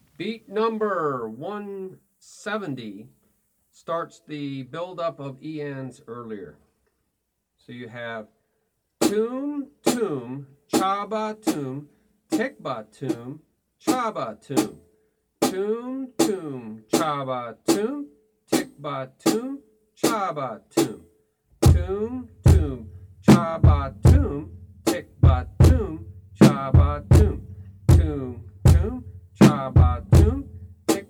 Beat 1 2e 3e 4e Toom Toom ChaBaToom TiBaToom ChaBaToom